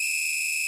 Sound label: referee; Sfx